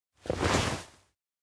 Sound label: pull; awayuki; anemoi